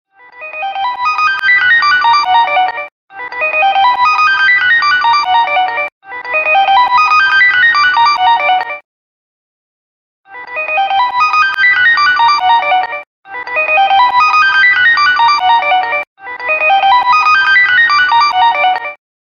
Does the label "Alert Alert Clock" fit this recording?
Yes